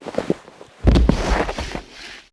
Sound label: Shootdodge; characters